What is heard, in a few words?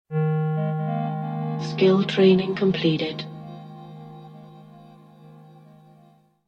skill training completed